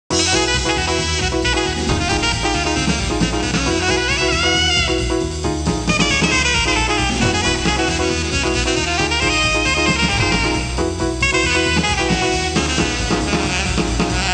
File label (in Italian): suonerie musica jazz